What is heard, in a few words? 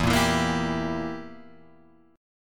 E Diminished down Guitar Open G